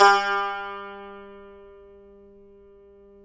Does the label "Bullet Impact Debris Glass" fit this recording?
No